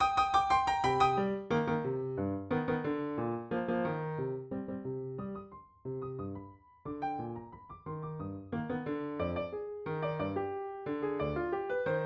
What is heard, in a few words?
Scherzo for Piano